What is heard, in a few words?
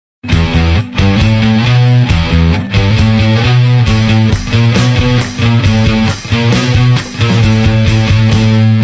Shred; Nokia X6